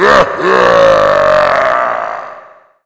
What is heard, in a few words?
death; quake2; coop; Hueteotl